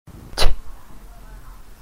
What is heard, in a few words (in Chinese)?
受击; BattleCry